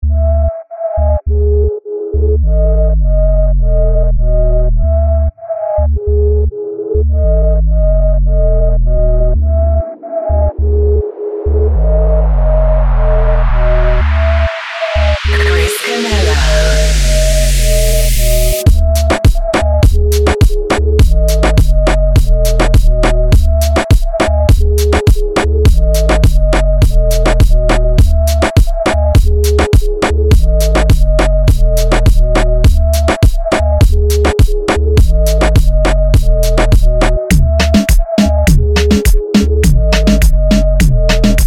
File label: BEAT